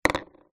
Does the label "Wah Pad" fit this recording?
No